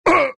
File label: axe pain; dota